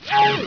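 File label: DOOR CLOSE; Meteor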